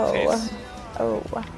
oh ohh

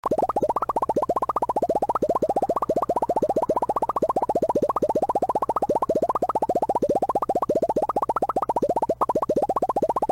plop

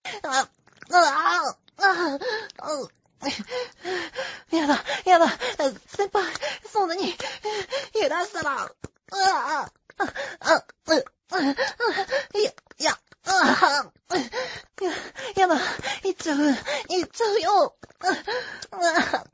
moan

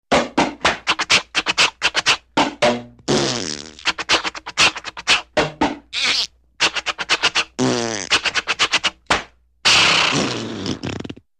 chuggy farty engine

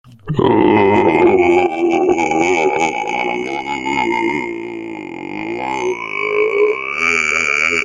ohhhhhhhhhhhhhhhhhhhhhhh

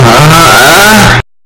yell earrape

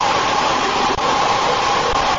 rat jumpscare sound; shadow rat

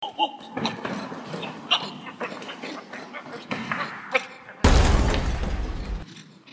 death

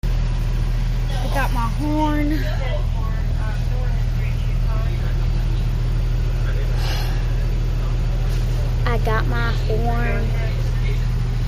horn

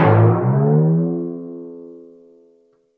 timpani boing

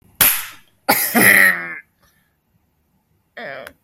slap ow

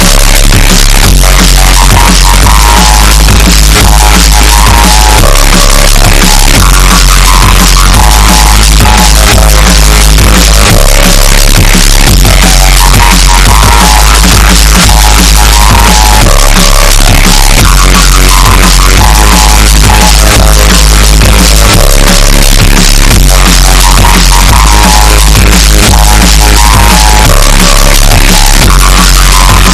hardcore flute

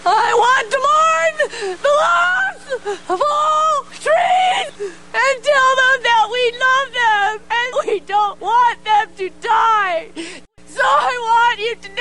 crying mourning; trees

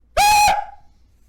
jumpscare

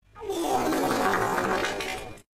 fard sound but its slow

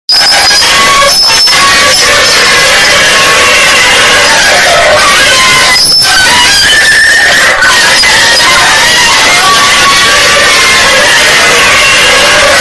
screeching

